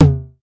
Short Tom Sound C# Key, Royality free tom tuned to the C# note. Loudest frequency: 735Hz